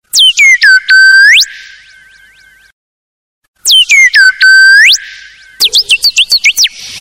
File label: High Pitch Bird